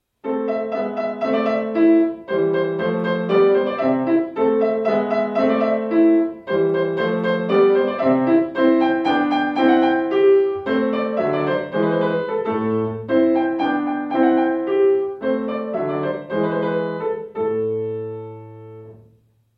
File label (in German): Gavotte, klavier; Pachelbel